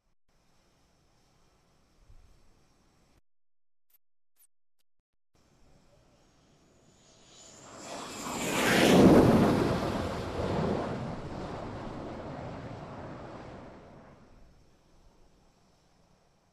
Aircraft fly over at top speed

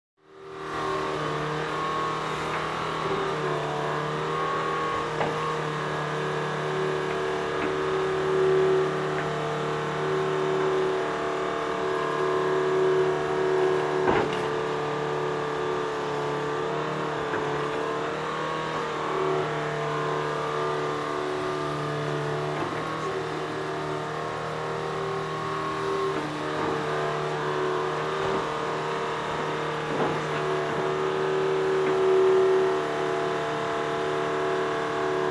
Construction

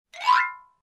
Xylophone ascending scale

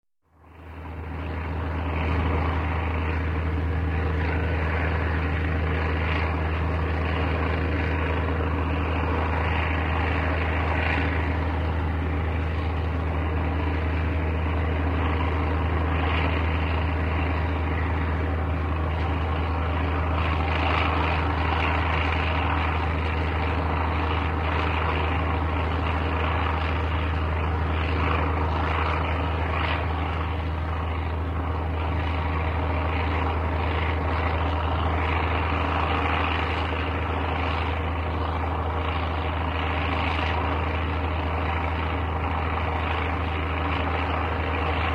Helicopter Flying Whirring Distant

